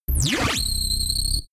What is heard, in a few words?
defect laser beam; soundpool